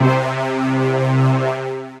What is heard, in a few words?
Horn Dweller; Ableton; Retro Synths; P-50 Linear; P-50 Structures